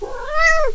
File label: cat follow